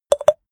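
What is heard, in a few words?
click rapid sequence up short, body sounds; koerpergeraeusche; menschen; soundpool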